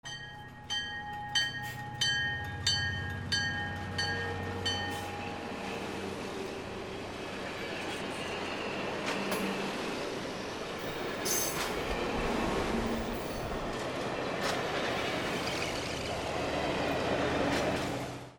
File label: TRAINS